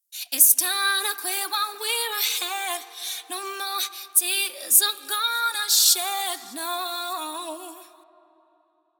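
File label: female vocal de-esser off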